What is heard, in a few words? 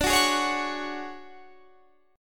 D Major 7th Flat 5th Guitar Standard, chord; strummed